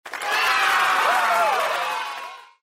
result success cheers

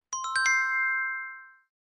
check Sound

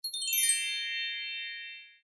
result success

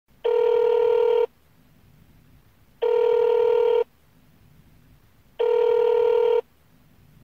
phone call

